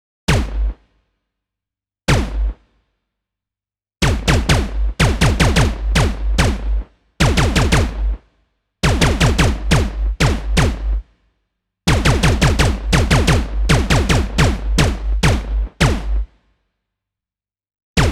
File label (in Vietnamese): Tieng sung ngan laser